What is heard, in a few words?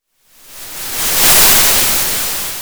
Sound of waves crashing on a shore